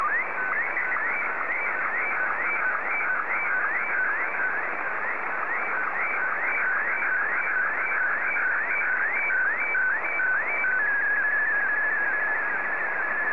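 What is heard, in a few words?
Martin 1, slow-scan television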